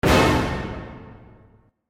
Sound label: Orchestral Percussion Hit 3 Dim; Drumwavy; Syntheway